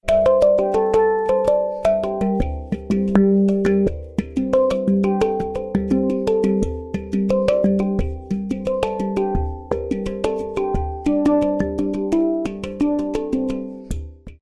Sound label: hang